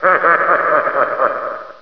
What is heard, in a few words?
laugh; Hexen II